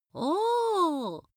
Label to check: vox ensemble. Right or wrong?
Wrong